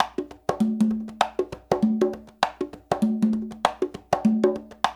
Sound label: LOOP, CONGAS, Percussion